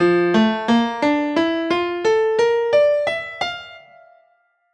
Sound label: hankdrum pelog tuning